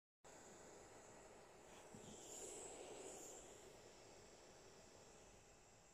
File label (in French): gresillement amplificateur audio